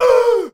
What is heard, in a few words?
UHH, SHOUTS; Best Service; Hyper Dance